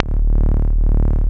BASS D#1; CMP - Analogon

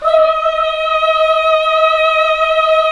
Nuns Hmmhh; Sound & Vision - Gigapack II

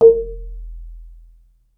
BASS MARI, Pitched Percussn, Bass Bamboo Mrmb; E-MU Producer Series Vol. 7 – Old World Instruments

